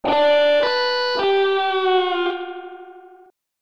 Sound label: pitch bend; the last note played on a distortion guitar is a bent note